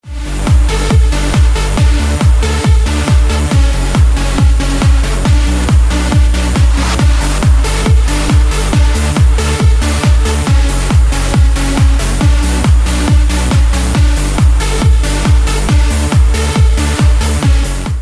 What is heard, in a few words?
Sms ringtone